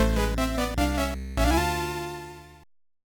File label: Music - Jingle; fushigi no umi no nadia the secret of blue water; pc engine cd; turbografx 16